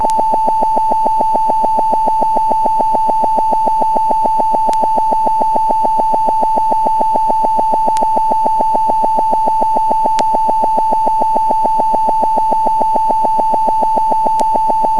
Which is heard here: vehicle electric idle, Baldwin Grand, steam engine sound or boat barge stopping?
steam engine sound